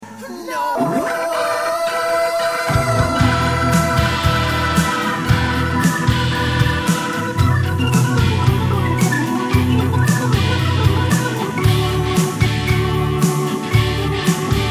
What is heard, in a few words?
lead sample Hammond M102